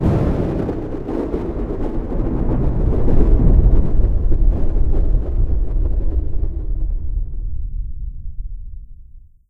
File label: thunder; fgdata